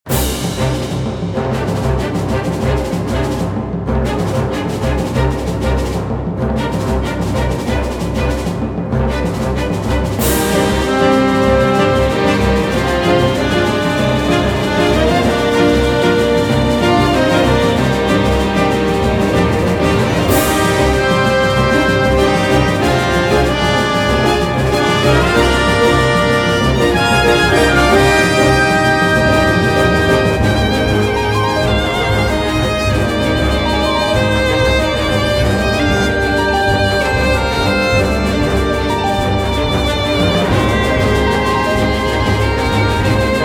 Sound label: Boss Battle Intro